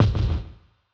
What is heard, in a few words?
Kicks; Touch Loops; CosmicFunk